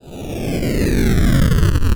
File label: engine